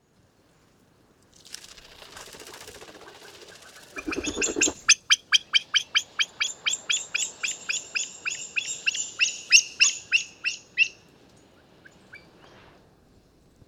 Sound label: Himalayan monal; پرنده کاکلپری هیمالیا